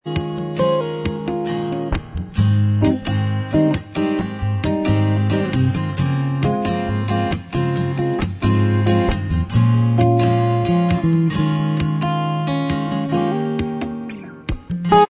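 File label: bossa version instrumental track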